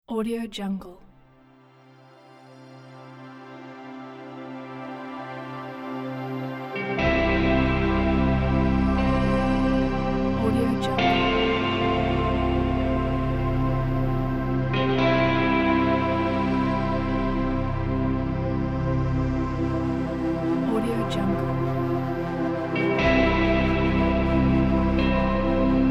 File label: emotional trailer